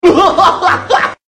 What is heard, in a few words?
Stupid Laugh